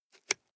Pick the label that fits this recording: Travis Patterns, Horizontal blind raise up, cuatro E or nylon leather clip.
nylon leather clip